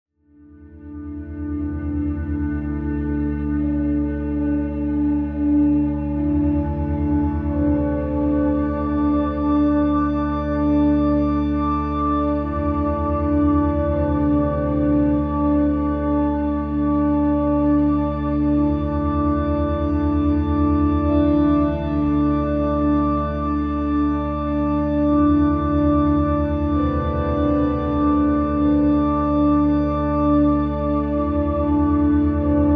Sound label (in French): Orgue